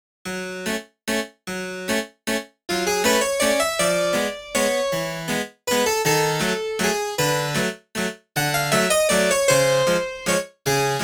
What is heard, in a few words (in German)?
clavier fis moll